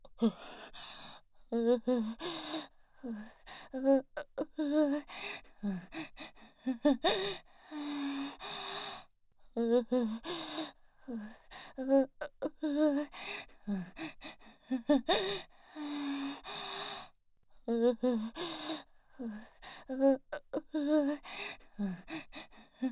吐息

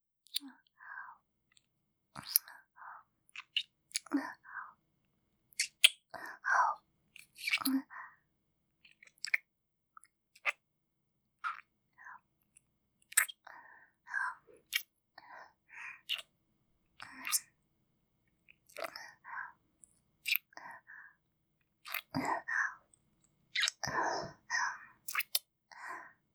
キス

